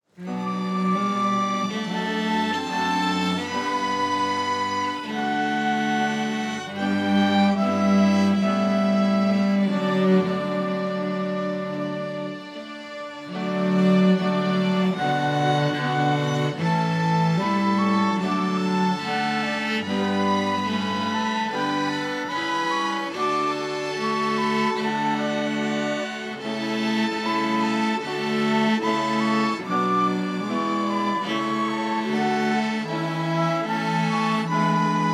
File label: Orchestra Prelude